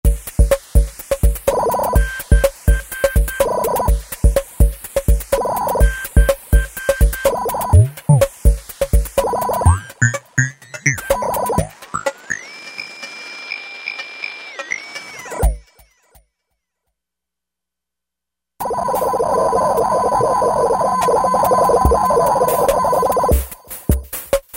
Radio Kit, Roland V-Synth; Factory Patches

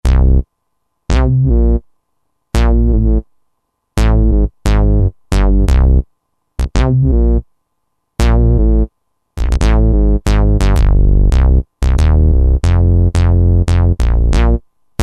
Arrpy Bass, Roland SR-JV80-12 Hip Hop Collection

